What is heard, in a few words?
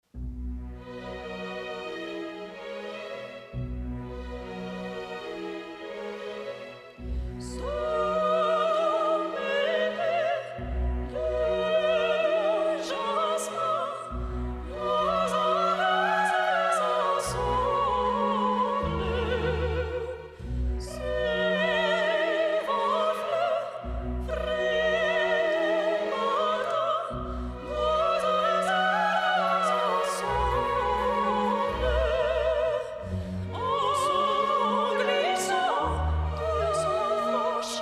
Flower Duet Mix